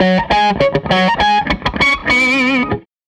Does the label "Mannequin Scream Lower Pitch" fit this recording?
No